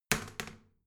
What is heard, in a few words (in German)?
toilet seat wall open regular, badezimmer